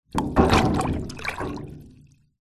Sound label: water tank wood batton drop into full small water tank water splash; industrial; metal impact; zapsplat